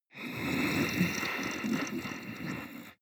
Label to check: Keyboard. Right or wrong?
Wrong